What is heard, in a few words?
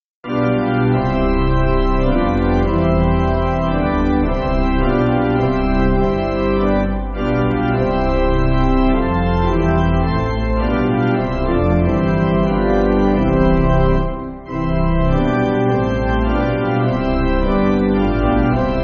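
Pipe Organ, Music Backing; Come Holy Ghost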